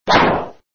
swordswing; Red Baron

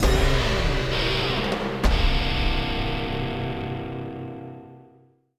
Action END

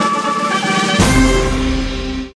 Jingle Victory Fanfare

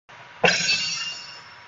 Critical Hit

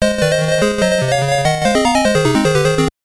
Jingle; Kaitou Saint Tail; Game Gear

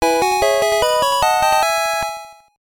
Fanfare Trade Pokémon Received

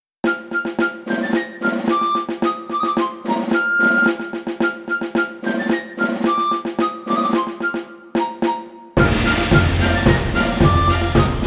Gavotte; Mana Khemia Alchemist of Al-Revis

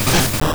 Bonemerang; sfx; attack moves; pokemon; gen 2; gsc

